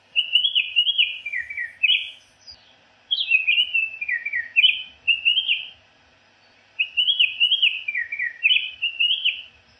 Baltimore Oriole

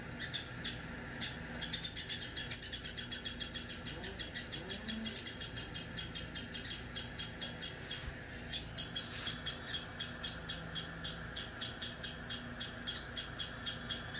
Downy Woodpecker

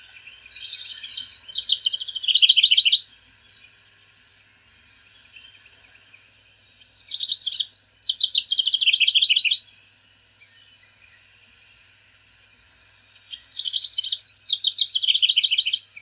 House Wren

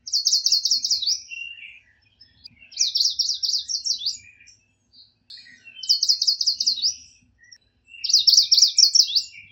Yellow Warbler

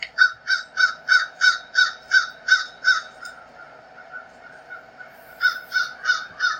American Crow

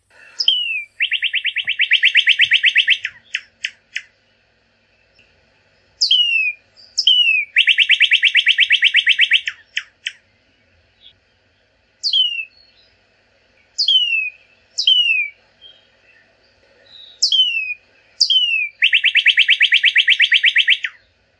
Northern Cardinal

